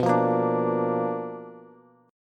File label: B-Minor Major 7th Flat 5th B down Bass; BmM7b5 strummed; JGuitar